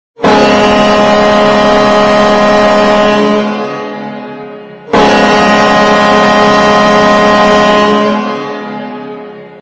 Hockey Goal Horn Sounds Car Horn

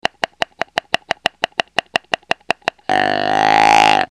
Deer Buck Rage Sounds Car Horn

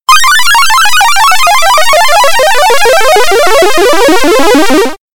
Game Over Sound Effect Car Horn

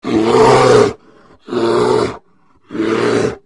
Grizzly Bear Growl Sounds Car Horn

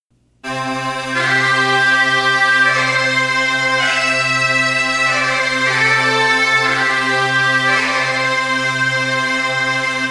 Bagpipes Sounds Car Horn

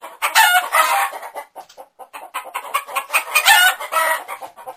Chicken Sounds Car Horn

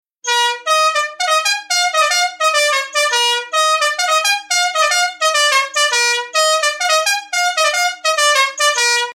Alma Llanera Musical Car Horn

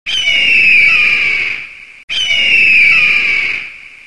Bald Eagle Sounds Car Horn; Musical Car Horn Wireless

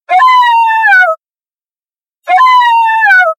Coyote Talk Sounds Car Horn

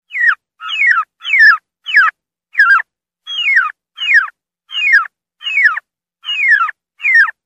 Turkey Distress Night Call Sounds Car Horn

